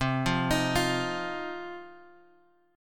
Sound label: C Minor Double Flat 5th, chord; Cmbb5 Chord {X 3 3 X 4 1} arpeggiated